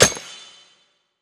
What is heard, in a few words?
Landed; netent